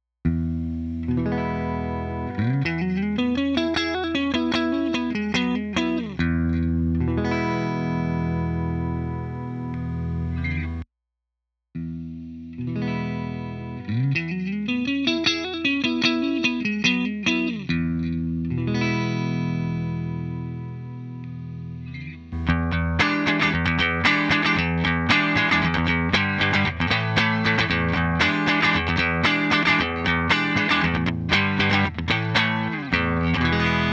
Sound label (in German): Helix Twin mit Boost